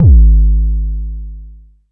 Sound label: m base, Jomox Mbase01